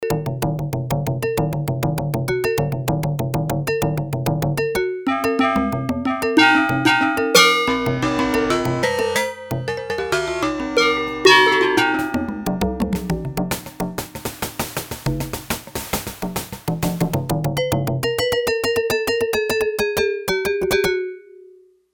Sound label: Percussion Split, Yamaha TX802; Performances; Factory Patches